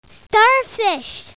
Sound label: STARFISH SOUND; KELP